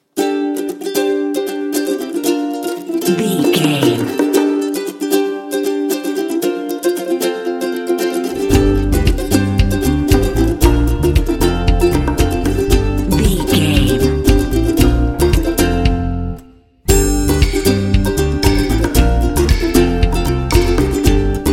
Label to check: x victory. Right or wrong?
Wrong